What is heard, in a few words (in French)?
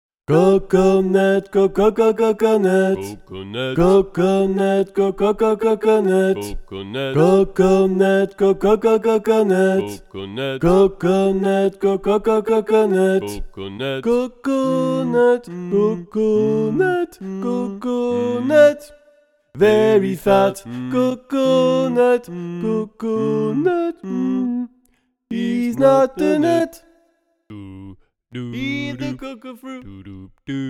coconut song basse et tenor, playback